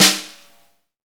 snare, Roland

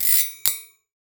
Grill Pan, household rhythm samples Hits

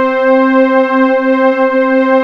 EZY BRASS, OBERHEIM; Rubber Chicken Software Sample Bank

